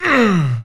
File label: grunt; mod xj18; dknight